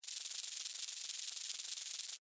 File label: Insect Wings Move, Animal, Insect, Wings, Movement, Shake, Bug; Blast Wave